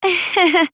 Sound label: ahehe; komaru